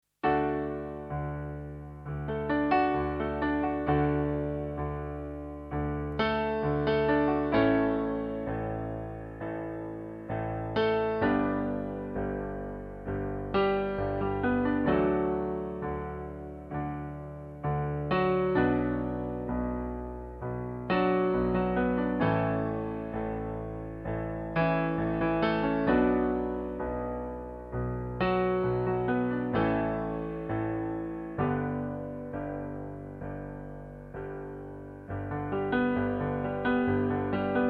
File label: piano